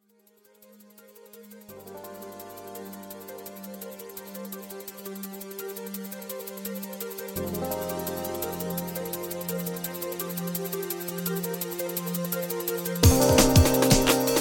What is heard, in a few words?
Sax Lick; Sax Lick 1